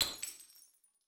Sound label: beat break glass; netent